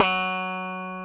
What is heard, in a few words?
FUNK BS F#4, SLAP BASS